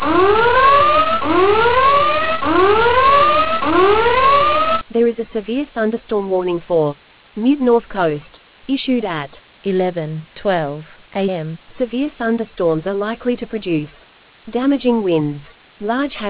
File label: storm audio